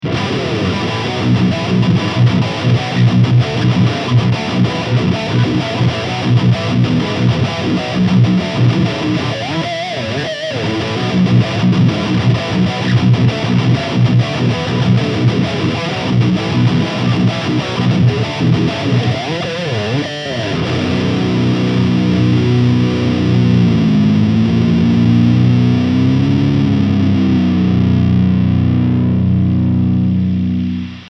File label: Ibanez AZ24047 High Gain